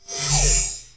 inventory off; Tribes2

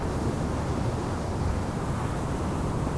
howling wind, environment; t2-mapper

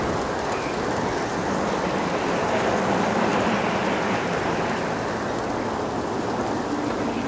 snowstorm, environment; Tribes2

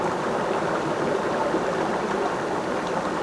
river, environment; t2-mapper

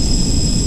outrider engine, vehicles; Tribes2

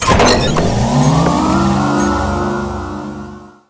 tank activate; Tribes2

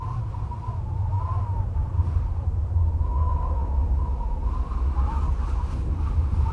moaning wind, environment; t2-mapper

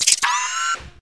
command off; Tribes2

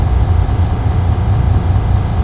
tank skid; Tribes2

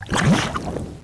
lava bloop; environment; Tribes2

